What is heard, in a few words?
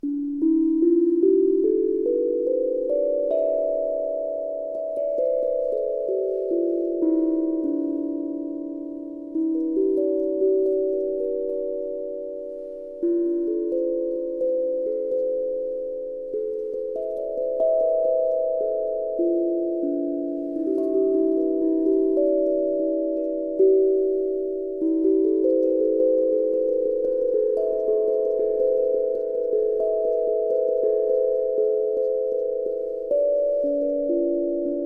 Phrygian D4 432